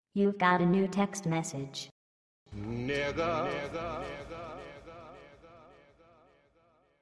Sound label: Ding; GTAV; iFruit